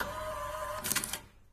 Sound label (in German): bus drucker; minecraft